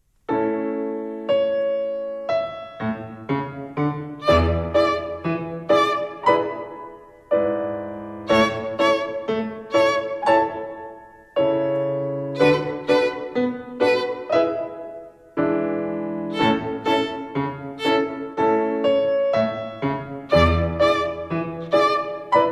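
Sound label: Rhythm with Three Fingers Second Twinkle Rhythm, violin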